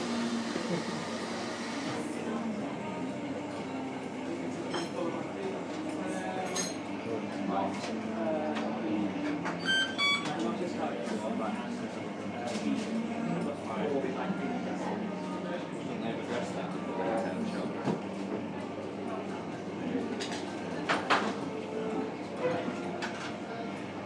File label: deli ambience; pickles and potter